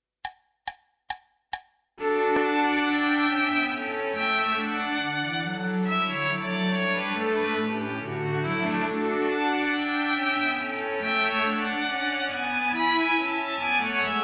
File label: gavotte; minus Viola